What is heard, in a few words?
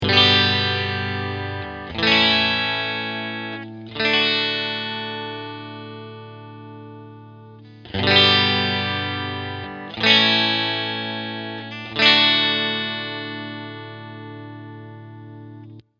Fuzz